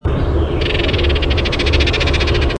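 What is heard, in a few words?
Baltimore Oriole chatter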